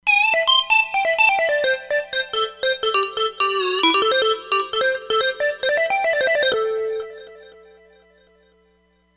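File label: Digital Lead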